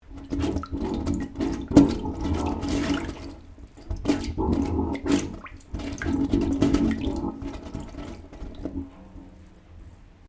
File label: Water Gurgling In Pipe; Weather